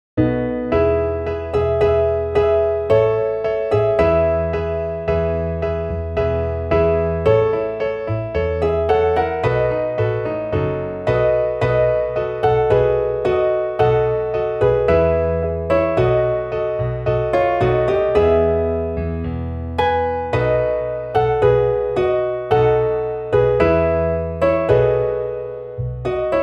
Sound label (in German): midi wandergesellen klavier